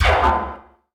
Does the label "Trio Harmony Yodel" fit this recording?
No